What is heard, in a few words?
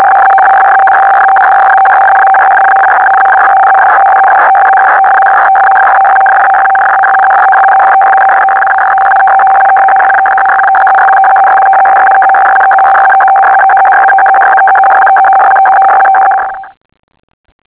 f3c fax